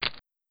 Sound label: chips med